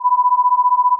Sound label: 1000 Hz plus 985 Hz 15 beats per second